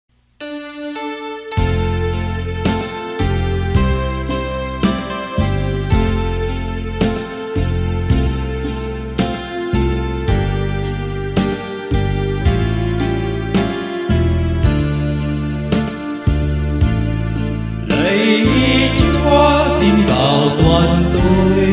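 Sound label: soprano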